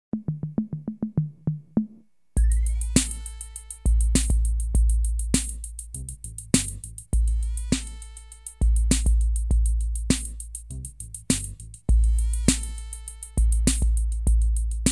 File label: Rap it up Slo; Soundzabound Volume 1 Disc 2